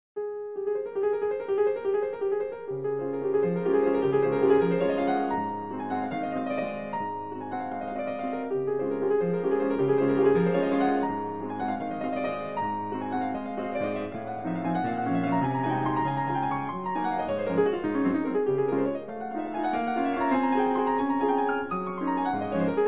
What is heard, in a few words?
Waltz No. 6 in D flat Major Minute Waltz Op. 64